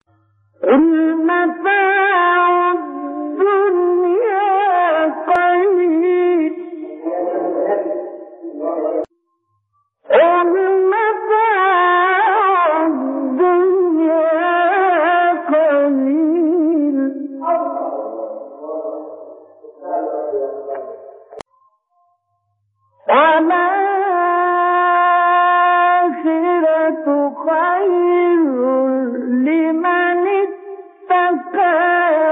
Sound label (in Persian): تلاوت فرازی از سوره نسا; مصطفی اسماعیل